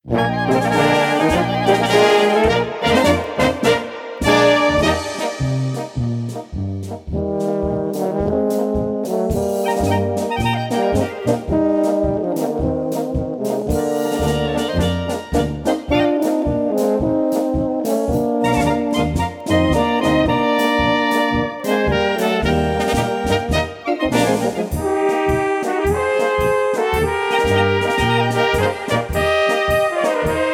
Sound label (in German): Polka